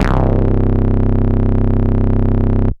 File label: DnB Bass dist C1, drumnbass 170bpm; musicradar; Bass A Multis